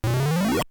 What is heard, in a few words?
end level; lode runner